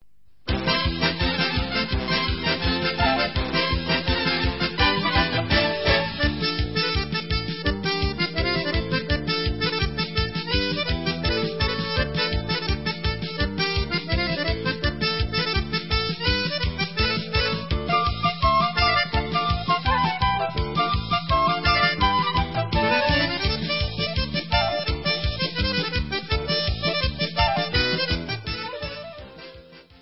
Hora Instrumental

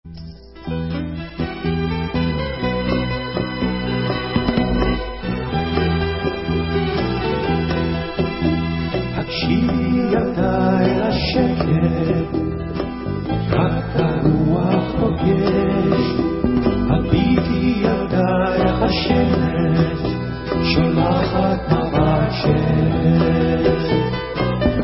Vocal

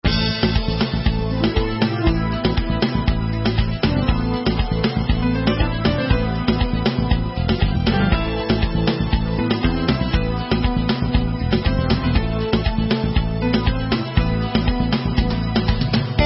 Instrumental

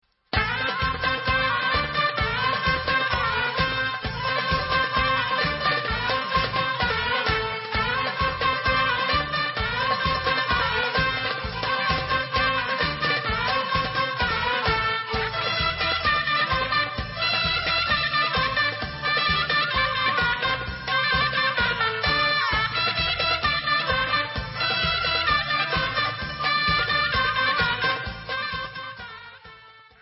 Debka Instrumental

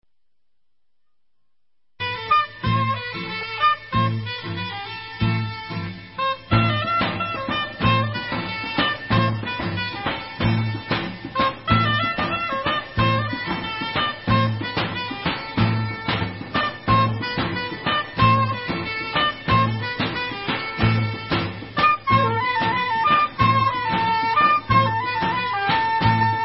Instrumental

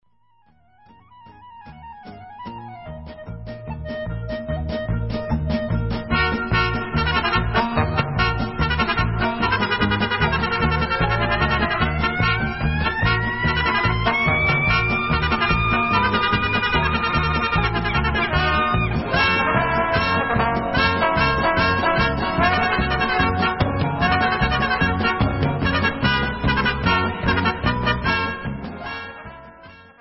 Hora Instrumental

